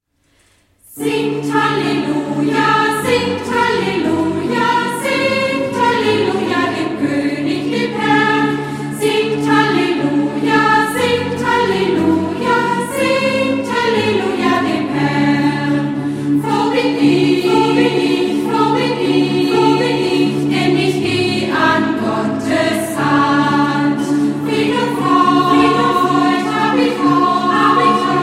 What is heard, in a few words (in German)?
Gitarrenchor